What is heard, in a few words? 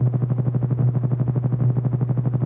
hold loop, physcannon; Source Engine; video game; weapons